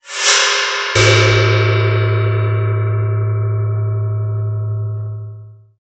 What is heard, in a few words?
Gong